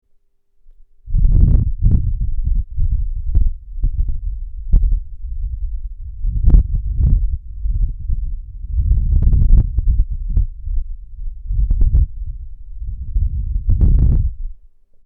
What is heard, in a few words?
blow